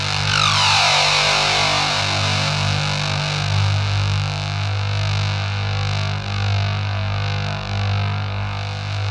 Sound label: ZWEEP, Synthy Tonal; Distored Reality